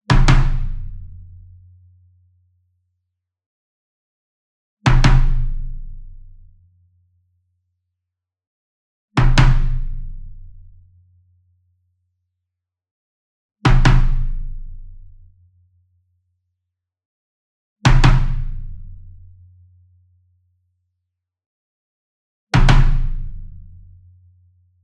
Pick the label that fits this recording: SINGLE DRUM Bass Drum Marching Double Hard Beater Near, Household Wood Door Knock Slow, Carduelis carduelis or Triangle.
SINGLE DRUM Bass Drum Marching Double Hard Beater Near